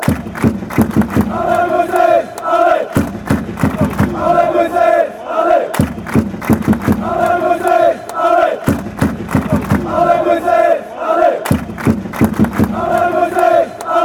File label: allez, fan chants; moises